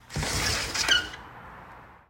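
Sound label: old window opening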